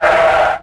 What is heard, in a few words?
Skids Shortest